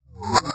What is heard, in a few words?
Retro Weapon Arrow